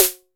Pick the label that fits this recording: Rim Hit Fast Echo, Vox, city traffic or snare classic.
snare classic